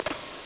step; Metal Gear Solid; gamesoundopedia

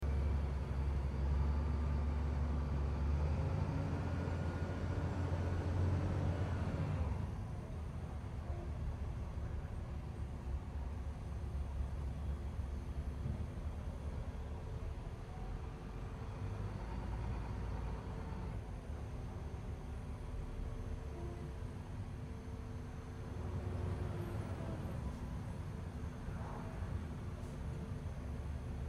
bus hintergrund; Life is Strange 2; gamesoundopedia

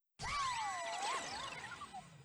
level end; battleblock theater

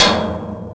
hit pipe; Where is my Water

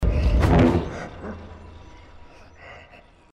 gameover; Little Nightmares; gamesoundopedia

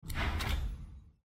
new quest; Divinity Original Sin II

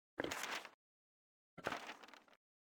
pick up and drop paper; baldursgate

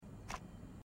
new hint item switch item sound; Life is Strange 2; gamesoundopedia

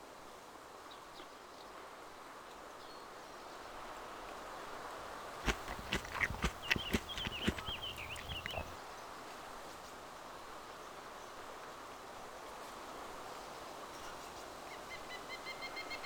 action eating; dayz standalone

